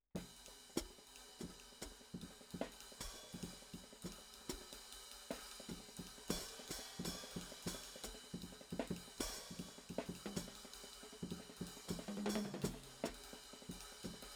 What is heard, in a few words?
HIHAT, drum n bass; RAW MULTITRACKS